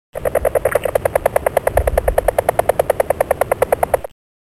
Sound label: rana luteiventris, frog toad calls; amphibians